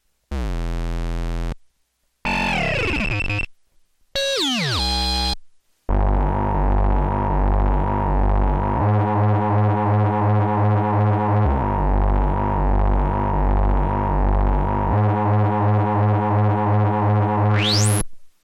Monotron

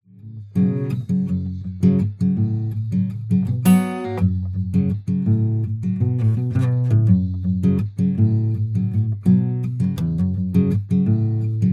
acoustic raw

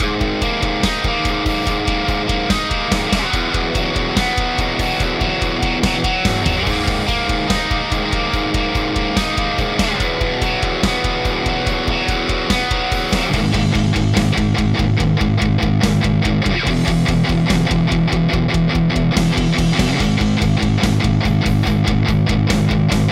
overscream

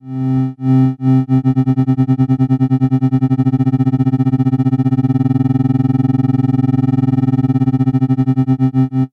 Tremolo

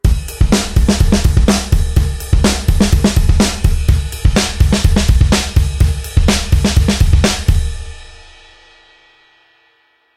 peaking

